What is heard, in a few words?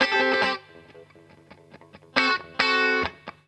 GTR, Roots Funk; USB Soundscan vol.41; AKAI